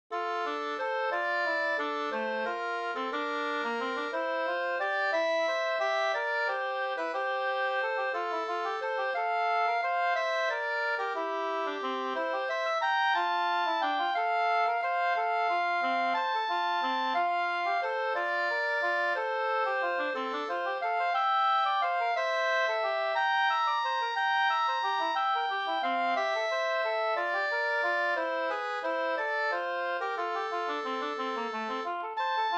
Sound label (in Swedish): vals familjevals F dur